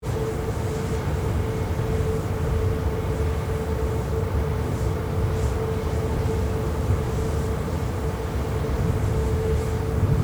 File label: exterior; paranal; vista